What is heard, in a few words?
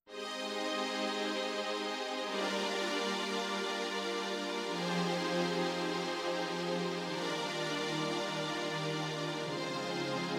Numa Stage Pad